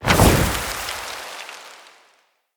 Fey Ward Flock Cast Release

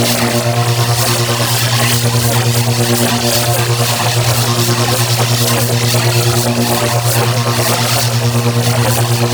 Fueling Station Exterior Energy Beam Loop; Warframe; Corpus; Railjack

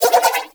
Nunchaku Prime Spin Whoosh; Weapons; Warframe; Tenno

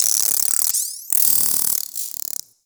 Venus Robo Fish Small Caught; Warframe

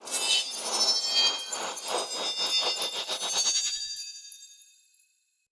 Throwing Knives Orbital Projectile Start; Pothia

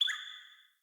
Cardinal Male Bird Impact Chirp

